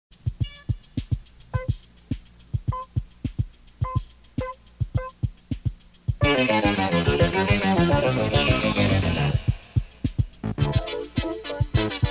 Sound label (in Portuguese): Vinheta; Antena 1 SP